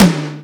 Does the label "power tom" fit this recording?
Yes